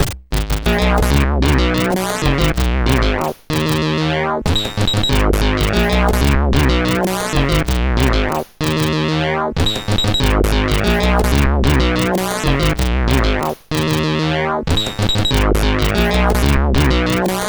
CSG 8580R5

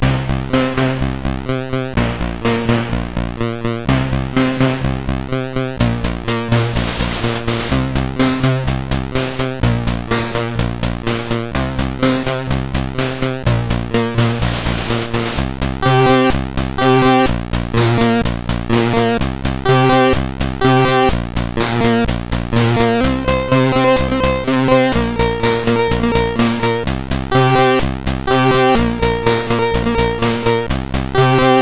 CSG8580R5, GAMES; Street Beat

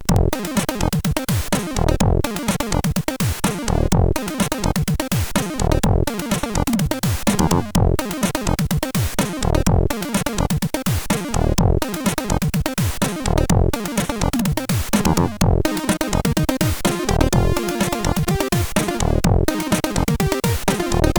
Short Popjazz, sid CSG8580R5

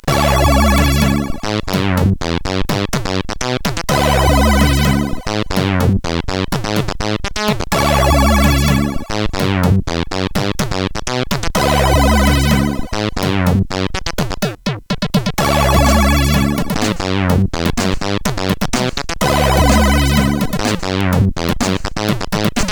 intro, CSG8580R5

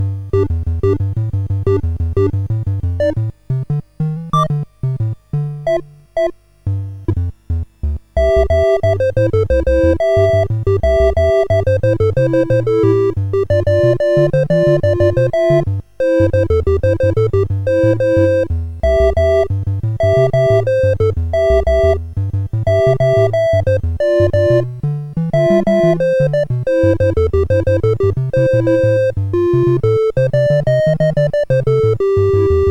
CSG8580R5

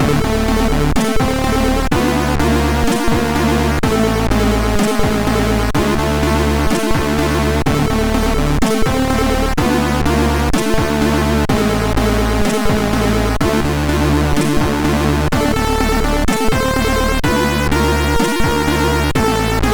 Race, sid, MOS6581R3

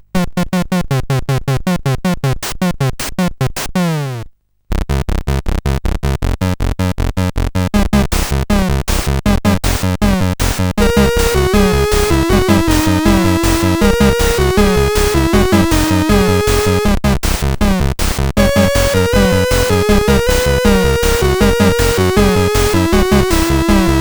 Balloon Raid, CSG8580R5; sid

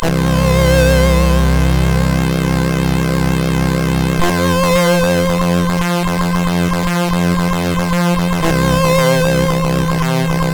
E-Load loader, CSG8580R5; hvsc

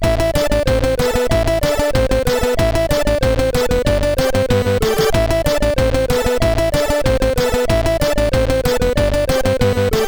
CSG8580

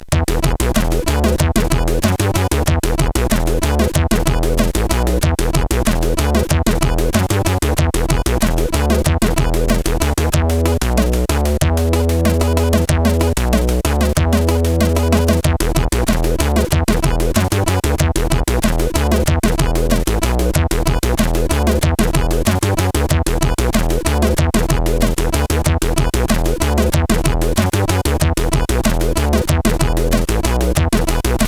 Weird Bass Rule, CSG8580R5

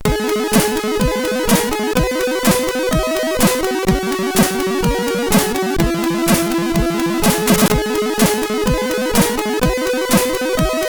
music, sid, CSG8580R5

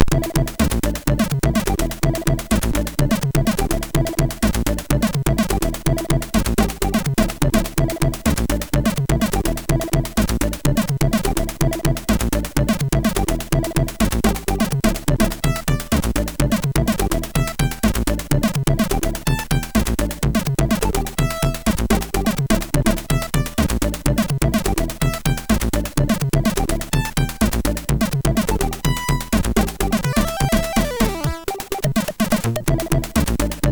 Jazz, MOS6581R4

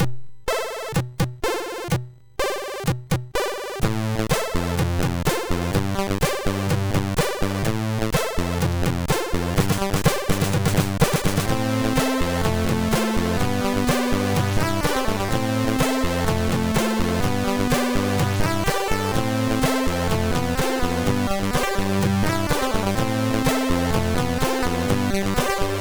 Tools menu, sid, MOS6581R2; Swappers

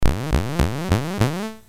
BASIC sid MOS6581R4, GAMES; Sword of Fargoal

